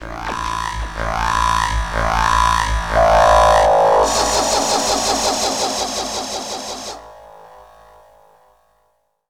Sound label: Vocal Laser; Jungle; FX; Blu Mar Ten - Jungle Jungle - 1989 to 1999 Samplepack